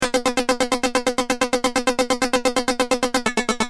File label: Keys, Industrial